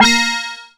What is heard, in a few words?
BELL-GONG, A#; Big Fish Audio